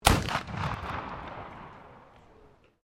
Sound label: MUSKET CAPLOCK 40-50 CAL UNKNOWN FIRING, at a shooting range; Black Powder firearms; Gun Shots